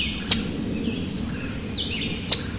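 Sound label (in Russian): разнообразное птичье щебетание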